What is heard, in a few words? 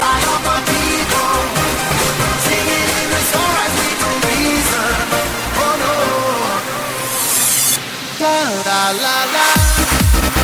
Electronic House Ringtone